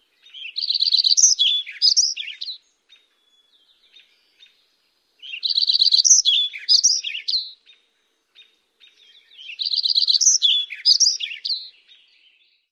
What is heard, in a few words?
songs